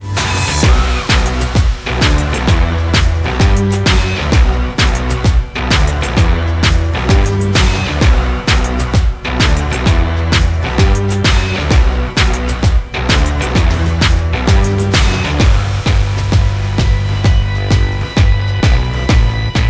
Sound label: UI Menu Theme Fun; Monster Jam Steel Titans